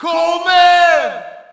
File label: Shout, audience; UFC Tapout 2